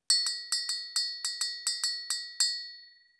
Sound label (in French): Cloche mandingue; clochesmandingues